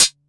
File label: Hihat Closed; Ableton Live 12 Lite; Core Library; Drums